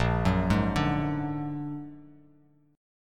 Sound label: Bb Augmented 7th Bb down Bass Standard; Bb+7; arpeggiated; JGuitar